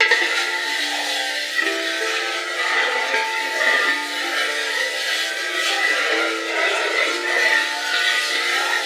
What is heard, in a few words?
GLISS; Xsample VOL-4